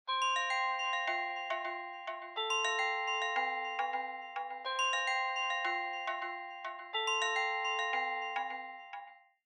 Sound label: Smartphone Ringtone Speaker